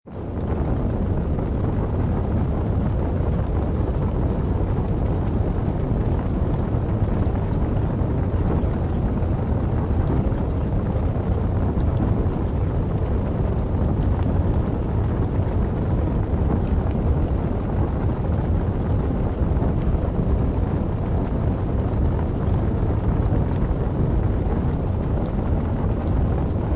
Fire Flames, Medium size fire burning almost completely without crackling, suggesting a containe; Medium size fire burning almost completely without crackling, suggesting a contained or isolated fire, perhaps wind blowing onto a large gas; Flame Sound Effects; Lynne Music